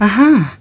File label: AHAHH; Raccolta oggetto; Suoni di gioco; Suoni di Lara; Il JukeBox di Lara